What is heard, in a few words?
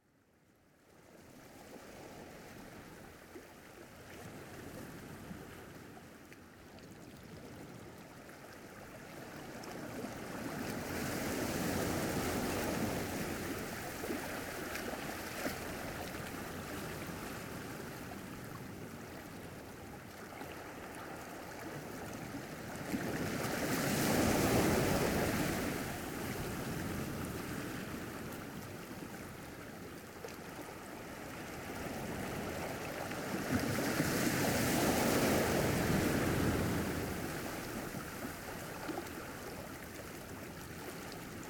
Ocean Waves